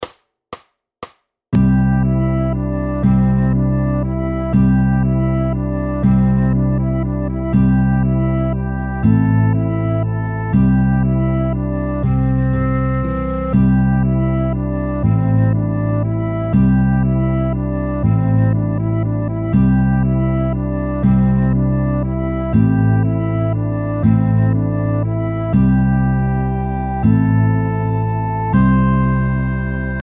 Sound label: morgenstimmung